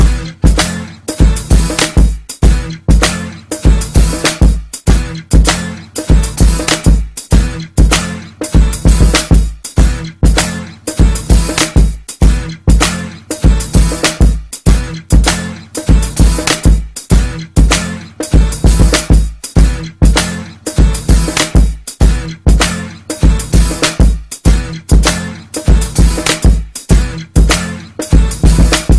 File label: instrumental